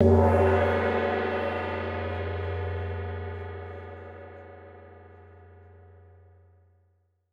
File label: Gong